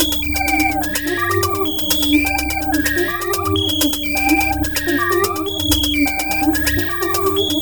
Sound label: Minimalism, Processed Percussion